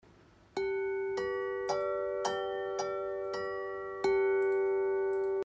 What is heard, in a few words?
Harmony Bells G4 G5; Blauw